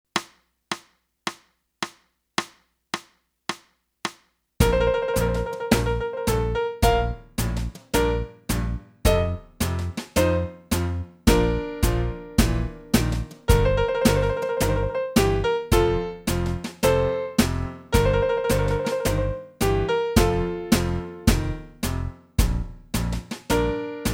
sax

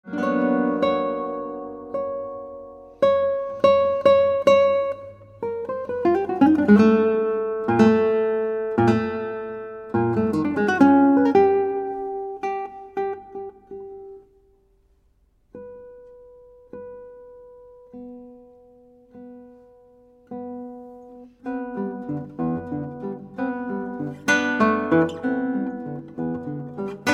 Guitar

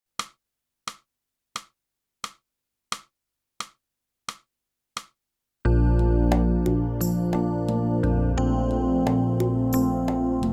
clarinet; boosey method

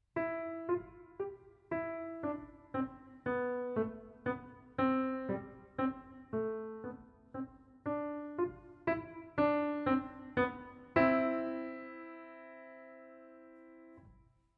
piano

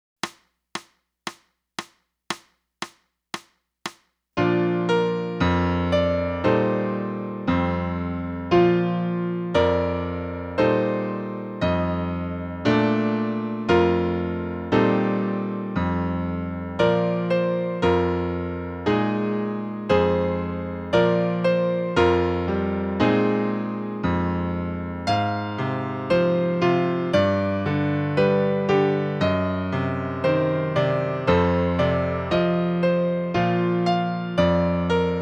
clarinet; boosey method

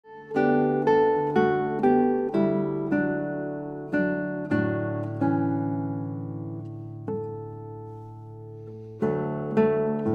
Guitar

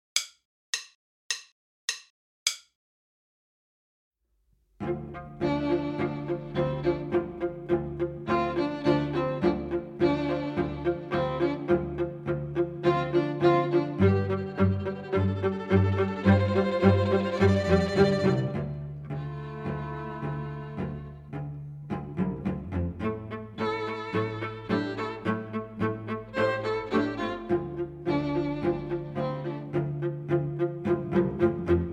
minus Violin II

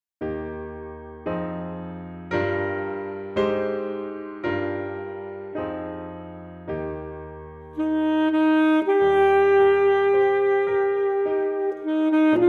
Folk Song Blues Full Sax

